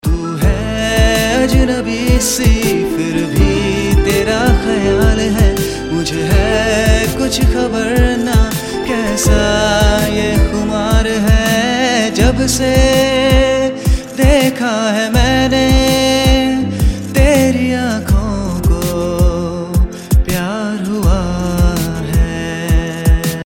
Romantic Tone

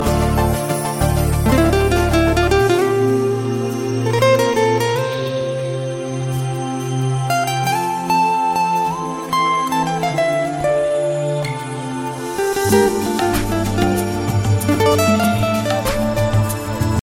Coolest Guitar

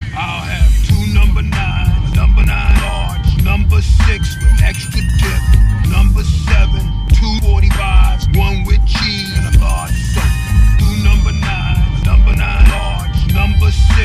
Food Rap; Big Smoke

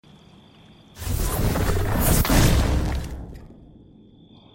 Grenade

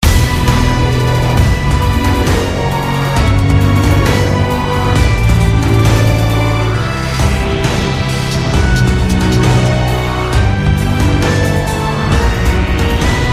Ghost Theme

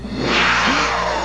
Demonas snarl

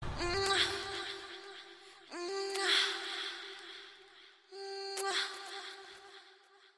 Kiss

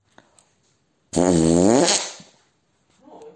Fart

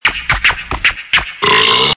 Scrach N Burp

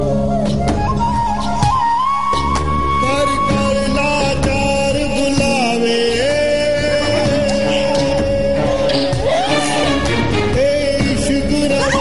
Male

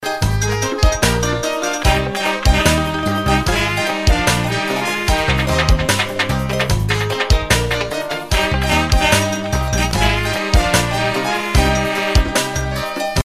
reggae country

